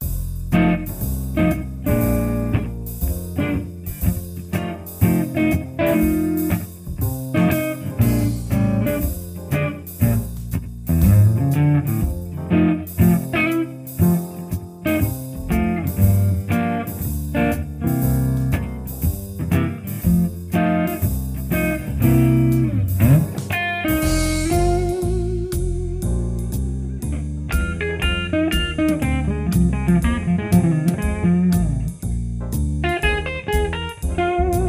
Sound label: Simple Jazz Blues in F